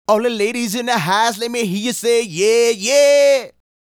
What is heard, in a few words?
Male Solo, Hip-Hop and RnB Vocals